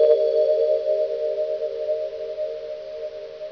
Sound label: TWILIGHT, BELLS